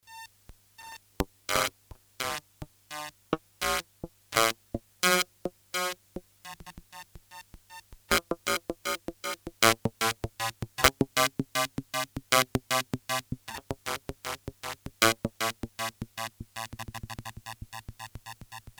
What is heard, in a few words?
warp633 guitar; modular